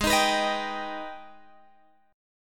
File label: Ab Minor Major 7th chord, Guitar, Drop D tuning; strummed; 18,18,21,20,20,x; down